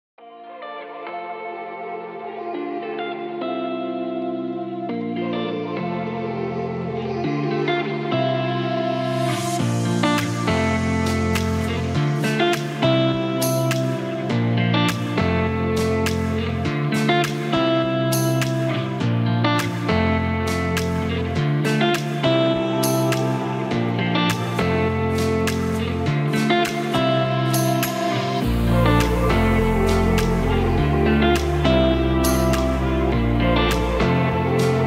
instrumental

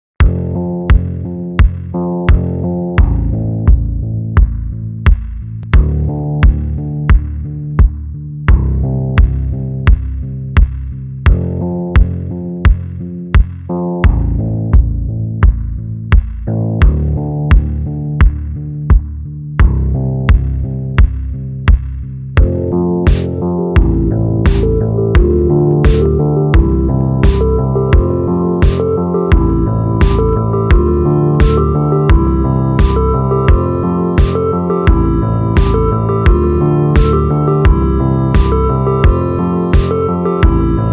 instrumental slowed slight pitch down

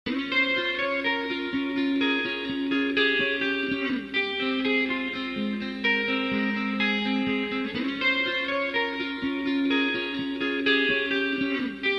super extended dance vocal mix

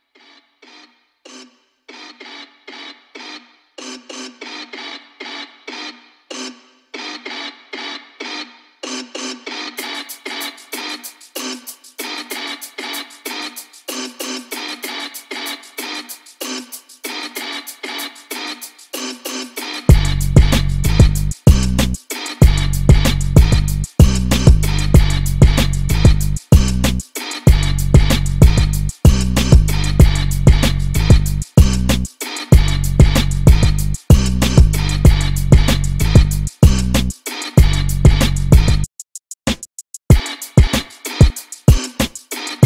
instrumental

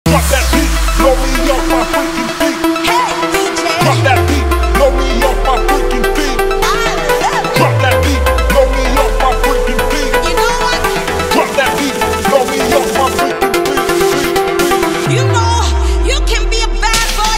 club remix car music

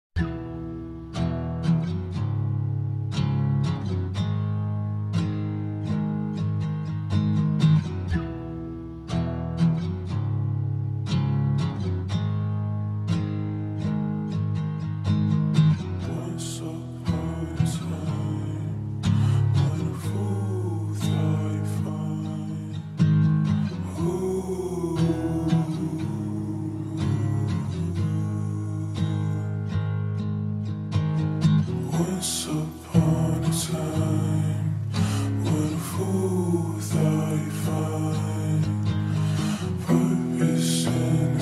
extended slowed reverb

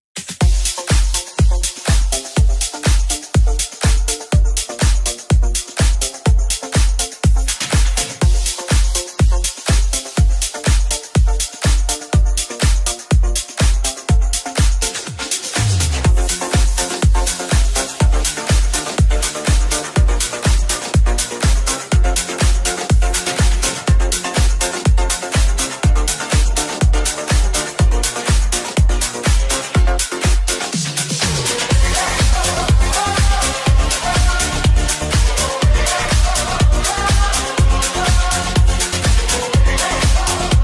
remix shuffle dance

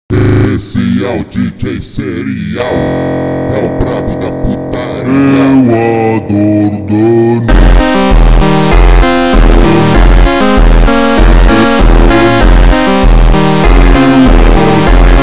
slowed reverb

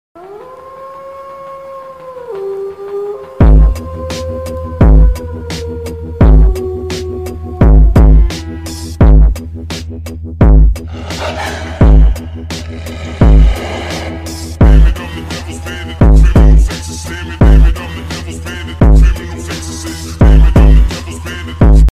remix ultra bass; tik tok

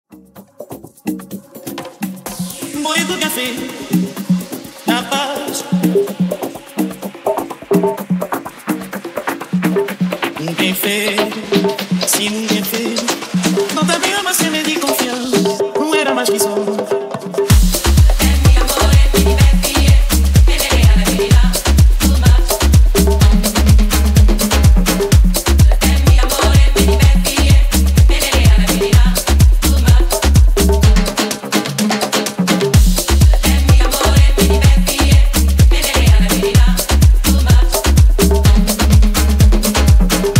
afro house remix

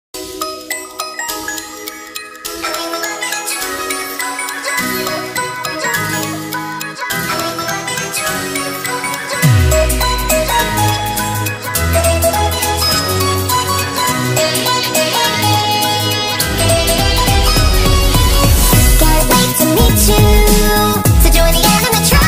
sped up nightcore

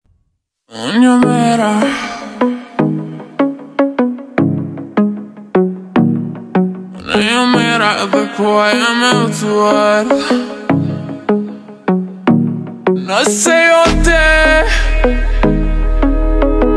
slowed reverb

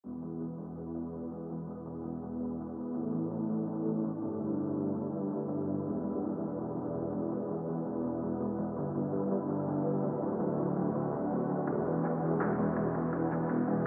wave phonk nightdrive

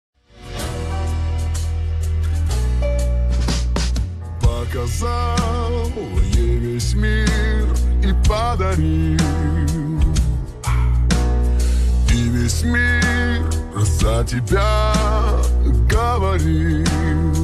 slowed

